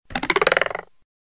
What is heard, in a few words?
Elastic Force, notifications